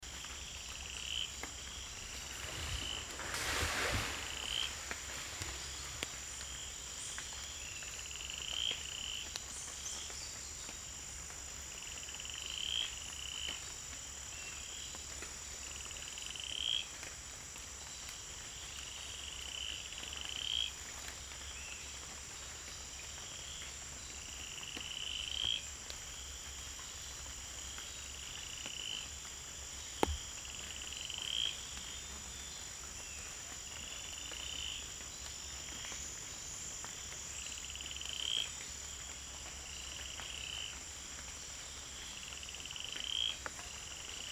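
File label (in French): Amphibien atélope jaunâtre, L'amphibien atélope jaunâtre dont le chant ressemble plus à celui d'un insecte qu'à l'idée que l'on pourrait se faire d'une grenouille; Guyane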